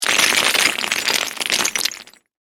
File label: Crash Brick Debris, Crash, Brick, Debris, Large, Dump; Sound Effects:Materials